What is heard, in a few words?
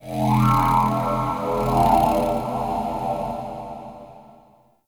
Root Cave Didge Texture, Ambience; One Offs; Neathuns Root Cave